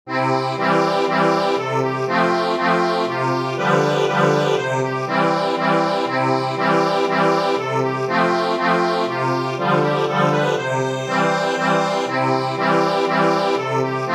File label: Swedish dance for accordion